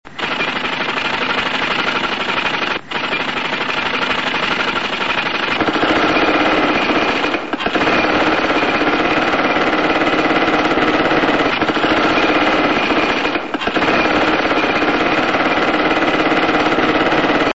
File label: drill